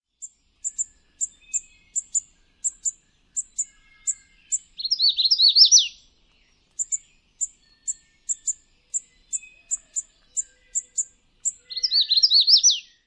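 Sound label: songs and chips, magnolia warbler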